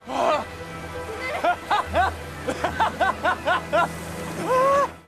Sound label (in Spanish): risa